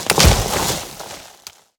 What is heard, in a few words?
ice smash; Scrat